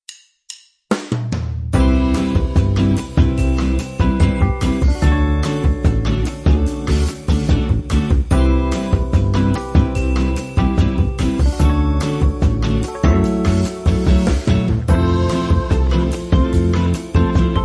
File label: Gimme gimme jazz